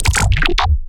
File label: glitch stab; Virtual Riot; Glitch Stabs